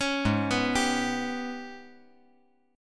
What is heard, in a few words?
Ab Minor Major 7th Double Flat 5th, down; AbmM7bb5; arpeggiated